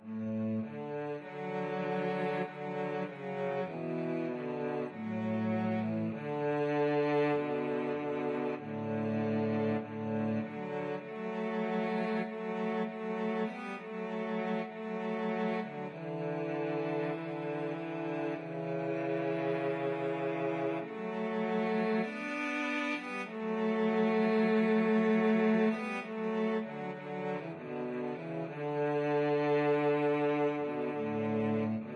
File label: cello duet